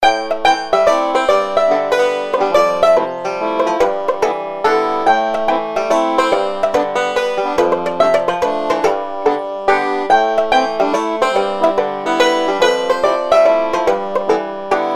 banjo music

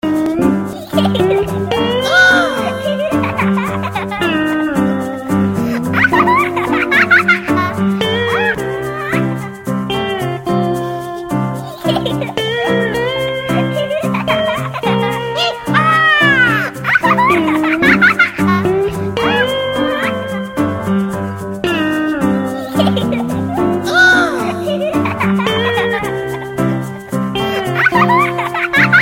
chuckles alarm

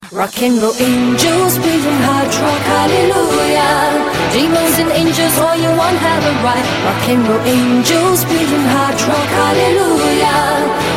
hard rock

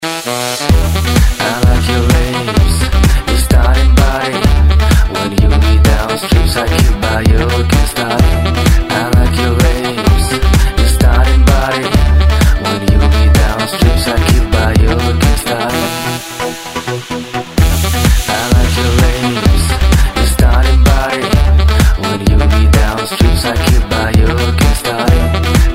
dance saxophone

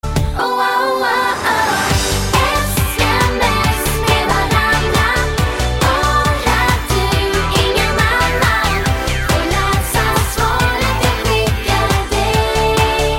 sms music

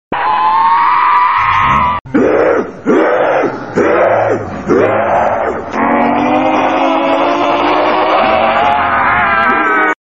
zombie screams

